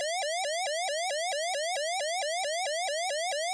warn; StarSiege

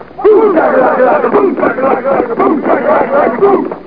boom shak

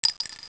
CLINK; Más de 2,500 Juegos

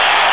crowd

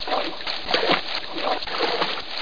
SLURP

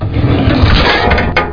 drawer; AVX demo

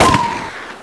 Freeze Pistol; toyspace

